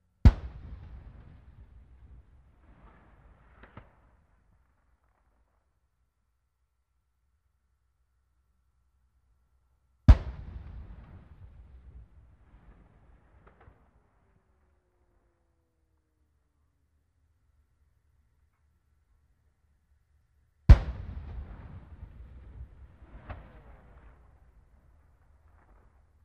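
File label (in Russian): далекий танковый выстрел 3 раза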